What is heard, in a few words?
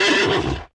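damage, sheep boss; horse boss; 12zi horse boss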